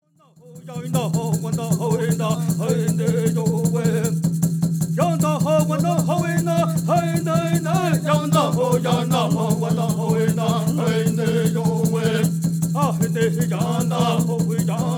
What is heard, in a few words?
Intertribal Peyote Song